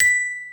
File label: Bell B6